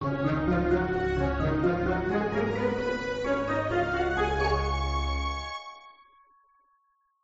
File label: level intro; gardenbloom